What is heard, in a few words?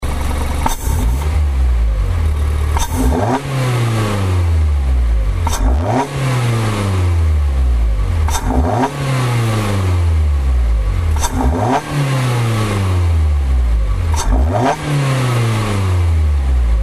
Miata MK4 sound; Intake; AutoExe